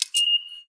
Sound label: pass ticket gate